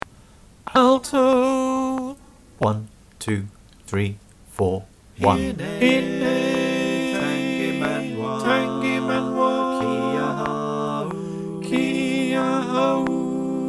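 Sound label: click track alto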